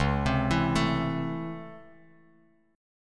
Db Minor Major 7th Sharp 5th, Bass Standard; DbmM7#5 arpeggiated; JGuitar

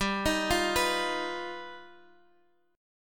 G Augmented 7th, Guitar, Half Step Down

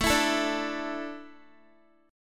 A Suspended 4th, Guitar; Asus4; strummed; x,12,14,14,14,x

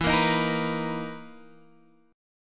B Major 7th E; strummed

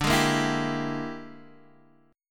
E 7th Sharp 9th Flat 5th D, chord

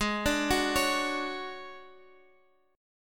D Major 7th Flat 5th, A flat

